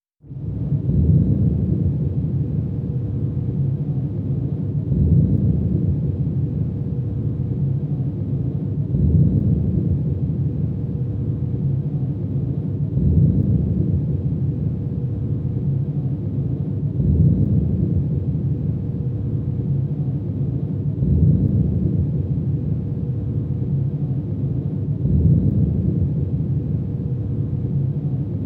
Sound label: Mechanical Sounds; Lost Planet; Mechanical Sounds (Type E)